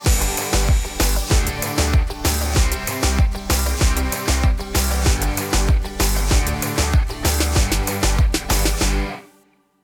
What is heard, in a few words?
Theme music; operation-arctic